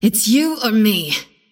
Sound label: attack pain; Anti-Mage